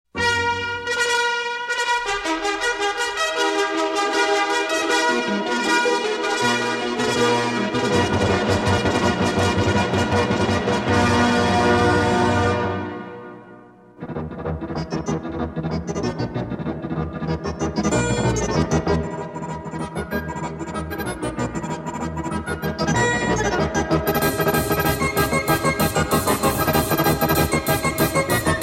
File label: Techno Remix; SS 2nd Style; William Tell Overture